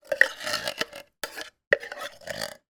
food can soup empty scrape out last bits with spoon; zapsplat